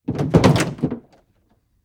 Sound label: Hummer Get Out